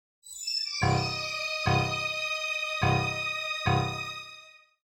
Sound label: suspense